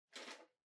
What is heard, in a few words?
Calculator (Far Noise)